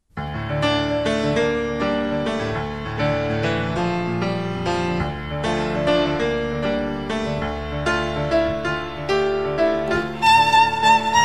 Violin

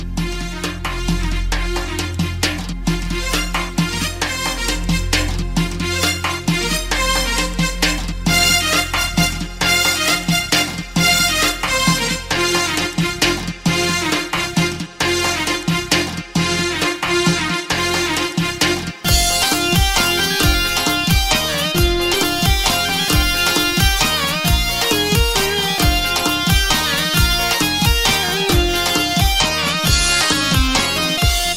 Violin

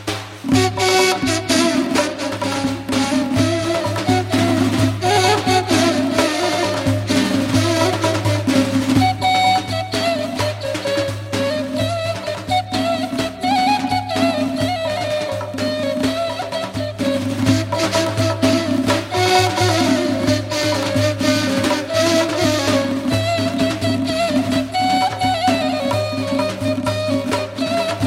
Ney Tonbak

